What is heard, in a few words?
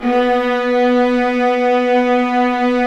Roland String Sections Violas; Roland LCDP13